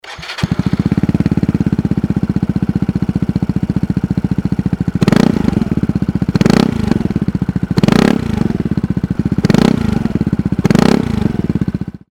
cygnus x racing

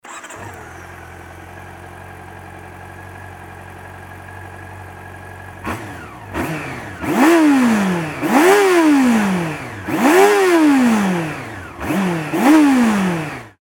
cb400 normal, cb400sf

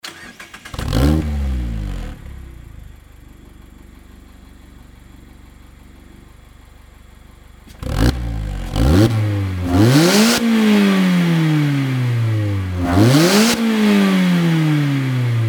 nd roadster; nd5rc; fujitsubo

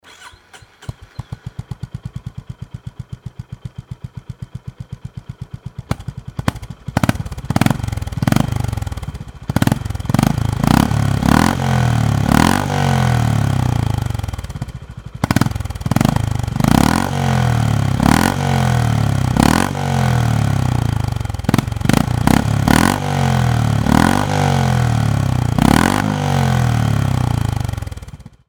gb350 normal

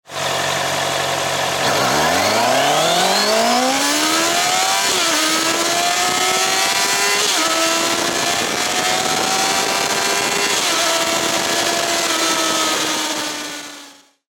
cbr650r acceleration; zx250e

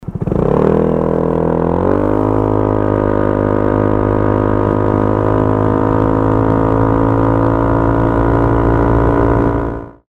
cygnus x racing acceleration; 22racing

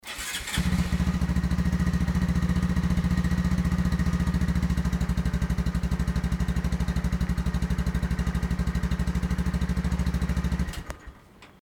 monkey125 normal idling

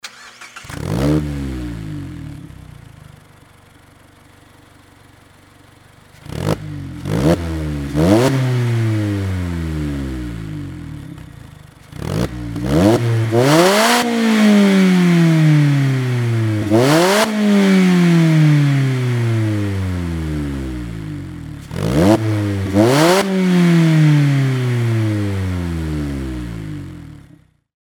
nd roadster fujimura bf; nd5rc

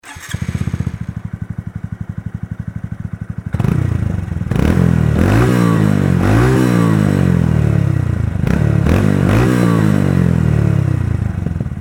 grom moriwaki

